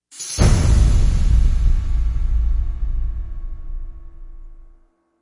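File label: Crescendo Attention; Brand X Music; Brand X Music Catalogue - Editor's Essentials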